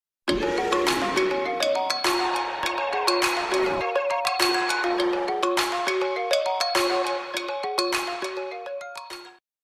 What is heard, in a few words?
Ringtone Mobile